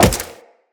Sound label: Wall Jump; Tenno; Footsteps; Wall Run